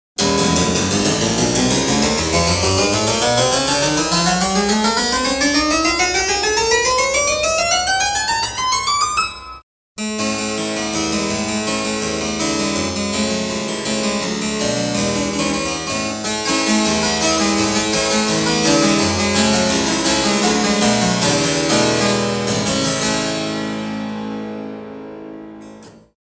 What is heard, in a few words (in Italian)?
clavicembalo